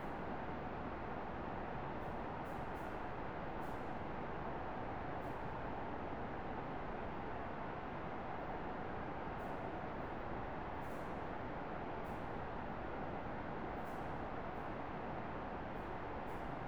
ambience generator

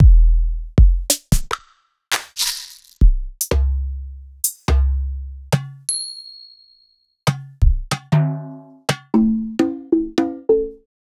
drum

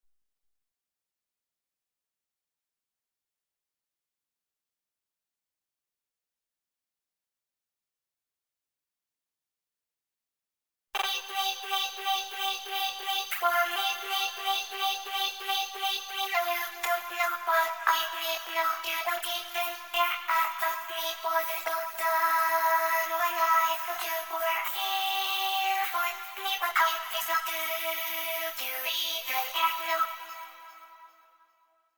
extra vox

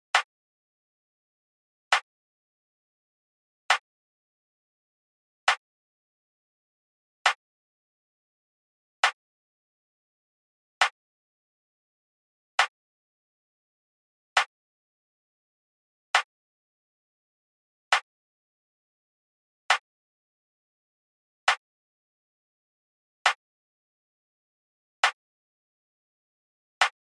offbeat snare

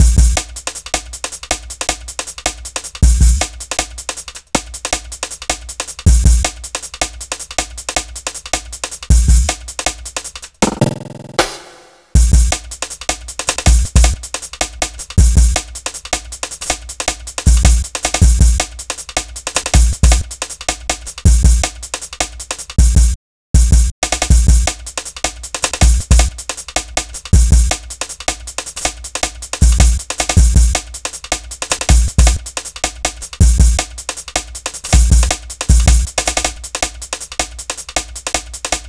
breath breaks

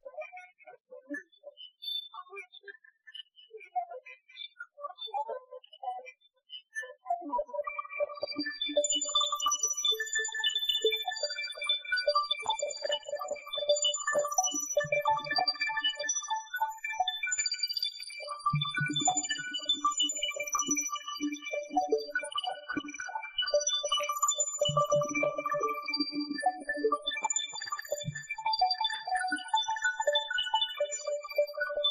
twinkly grains

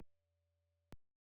vocals

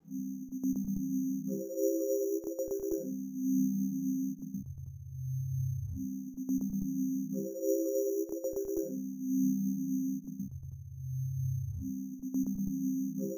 chords

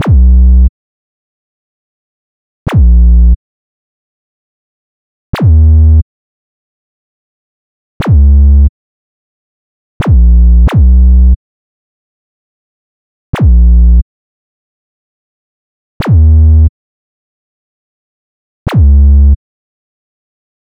bass

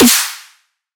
snare one shot mega dubstep

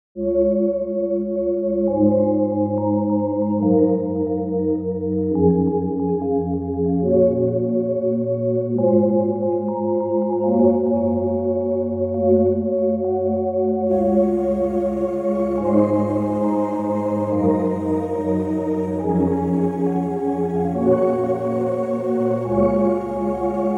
stupid art inst

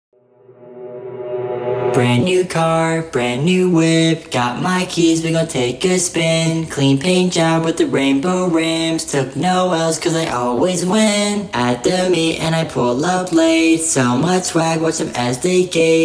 vocals 100 bpm E maj -20 cents

